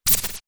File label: Electric spatter